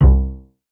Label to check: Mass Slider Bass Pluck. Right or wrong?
Right